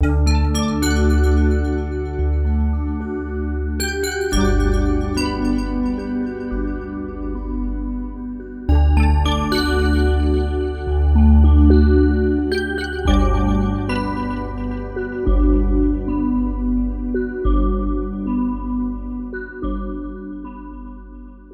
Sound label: EchoBells